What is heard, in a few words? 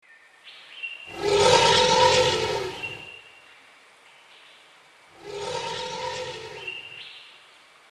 elephant